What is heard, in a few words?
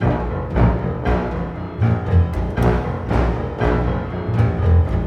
GRO, Orchestral Loops; 128 COAST ROAD